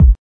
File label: classic hip hop kick; STYLE KITS (Hip Hop, Etc); Classic Hip Hop Mega Kit